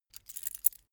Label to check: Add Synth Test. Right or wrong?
Wrong